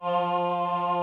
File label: VP CHOIR, VOCODER; Greytsounds VOL-1